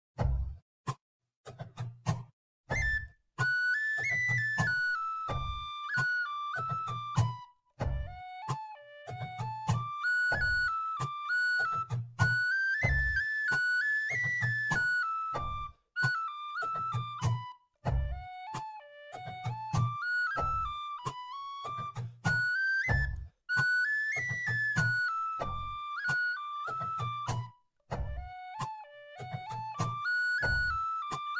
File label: whistle percus slow